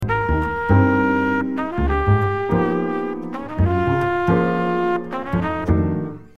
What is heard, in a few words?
drums